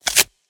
minitables show; Burning Reels; wazdan

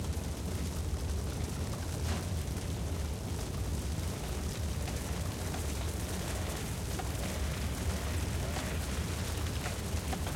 ambient sfx; Burning Reels; wazdan

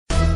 mystery short; Criss Cross 81; wazdan

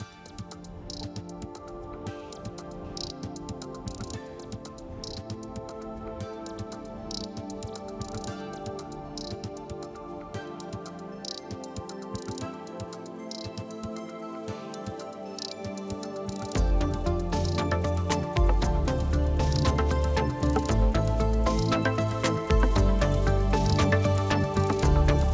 ambient fruits; Burning Stars; wazdan

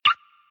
stop scatter; Crazy Cars; wazdan

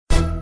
wild stop fast; Criss Cross 81; wazdan

